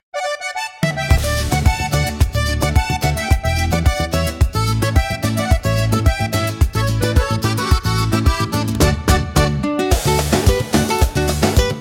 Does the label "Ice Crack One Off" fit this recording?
No